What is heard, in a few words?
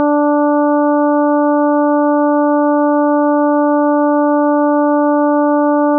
293.33Hz